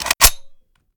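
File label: sniper reload empty extended mag charge; gmod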